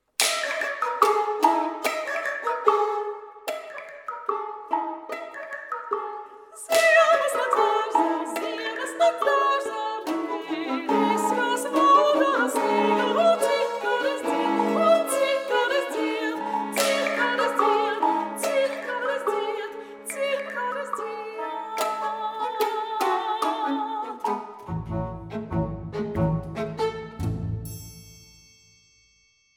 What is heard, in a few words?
Allegro vivo; Four Japanese Miniatures